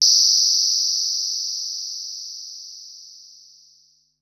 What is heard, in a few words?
Noise High, Korg Wavestation-SR, Drum Kit